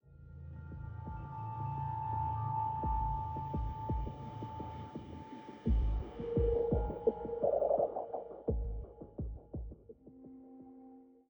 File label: Military High 85bpm; Legends of Pegasus; windows gamerip 2012